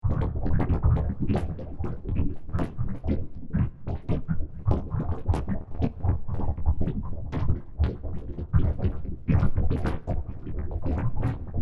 Thick Boiling Liquid, Sound of bubbling thick soup boiling.; Kitchen; Household